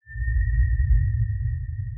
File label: sonar Ping Suit Far Beacon Directional; Barotrauma; Real Sonar